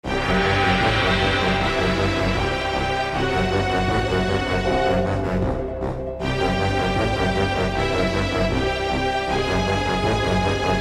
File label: eerie; Warhammer Dark Omen